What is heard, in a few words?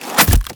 Ice Spell Damage Direct Frozen Bolt; Arctic Aura